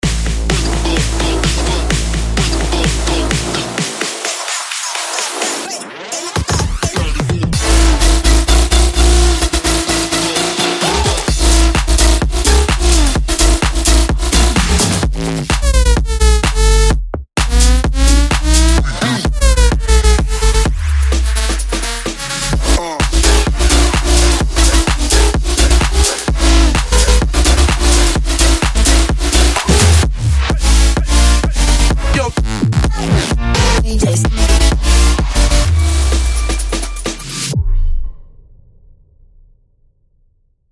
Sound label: bass house